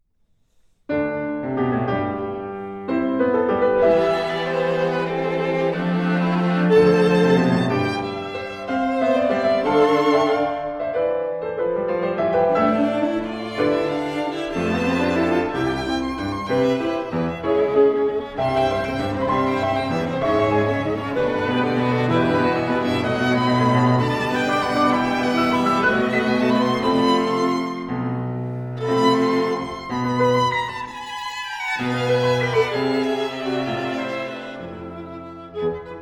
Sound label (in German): Klavierquintett, Klavierquintett 2 Violinen, Viola, Violoncello und Klavier; Klavierquintett Nr. 1 1. Satz; Oda Schmidt, Holger Grohs, Alexander Klemmstein, Eberhard Klemmstein, Tilmann Stiehler